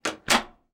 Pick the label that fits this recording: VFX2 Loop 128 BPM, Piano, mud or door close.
door close